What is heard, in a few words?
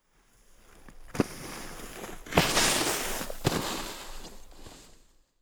skiing with jump